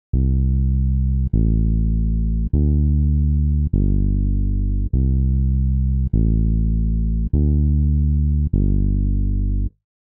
ligne de basse sur 1 corde; exercices

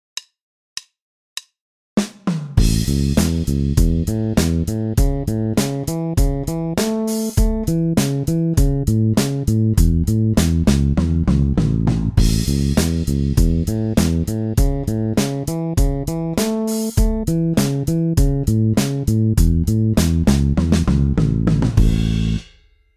5 cordes playback en la; exercices; arpeges

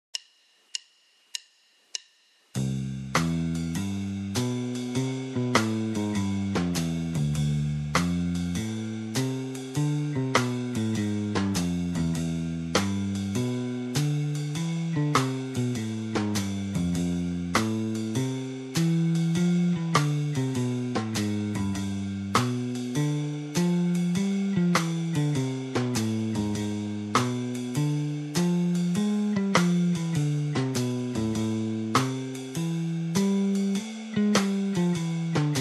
modes bebop majeure basse batterie tempo 100; exercices; gamme bebop majeure

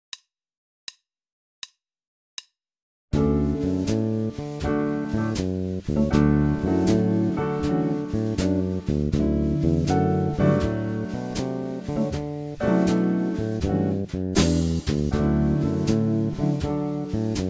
mode mixolydien accord majeur, basse; exercices

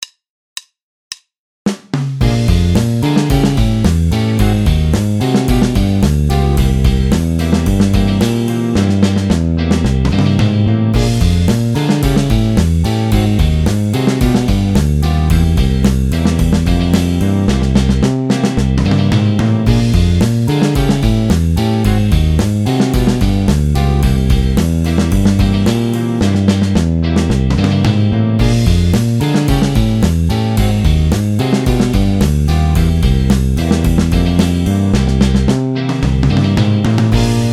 glisses

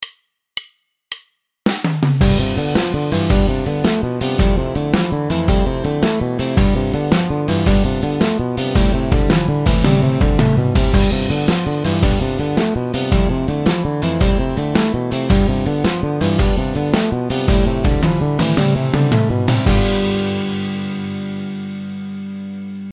tapping

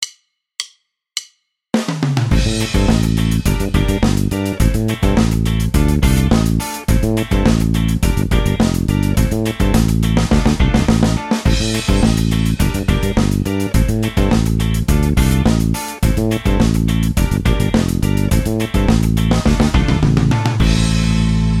octaves exercice, basse; exercices

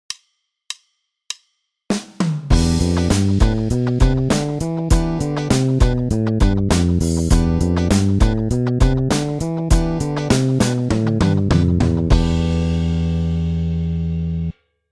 mode phrygien, basse; exercices

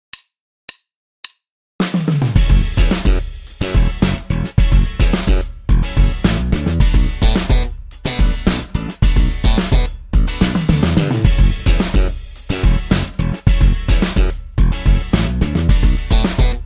slap exercice, basse; exercices

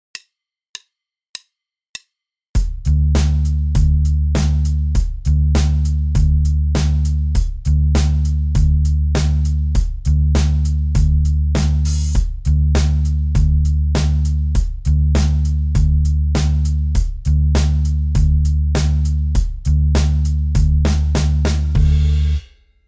contretemps et syncopes binaires tempo 100

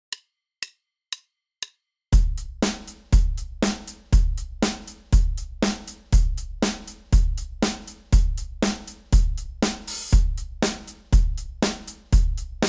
contretemps et syncopes binaires batterie tempo 120; exercices

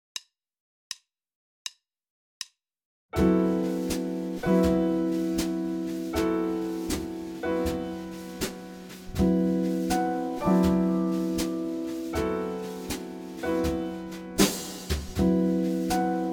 mode mixolydien accord 13 accompagnement, basse; exercices

